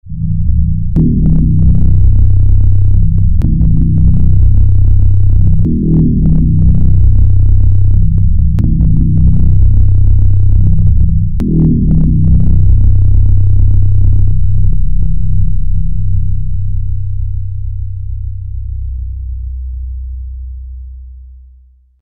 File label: Tension